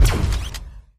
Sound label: Flak Canon, shots